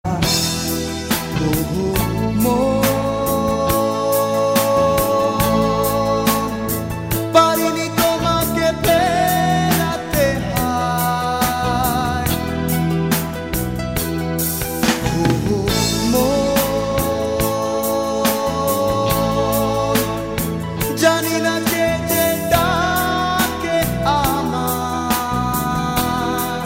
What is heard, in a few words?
Bangla Band Rock